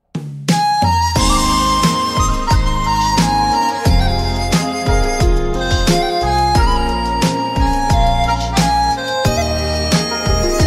nhac chuong khong loi

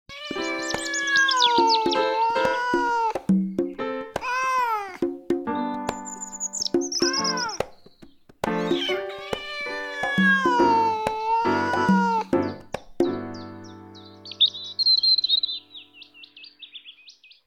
Tieng meo keu va chim hot vao sang som

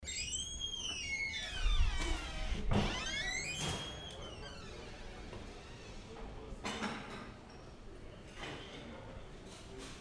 Tieng khach mo cua vao nha hang

